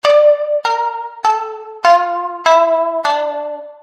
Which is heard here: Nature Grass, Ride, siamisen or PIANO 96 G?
siamisen